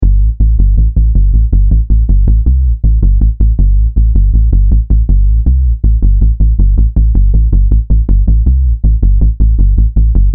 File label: bass